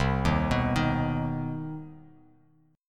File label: C Minor Major 7th C; arpeggiated; JGuitar